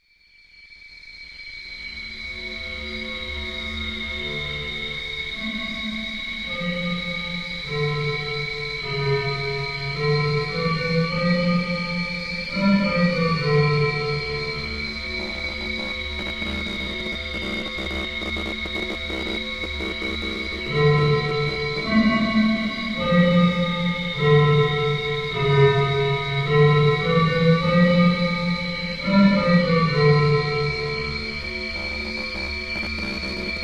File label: Oberon-Bottle piece